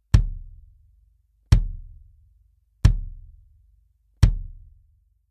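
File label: BASS Clr Bury Beater, bass drum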